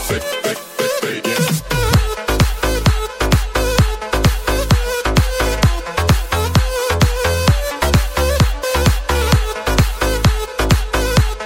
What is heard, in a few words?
arabic trance ringtone; samsung galaxy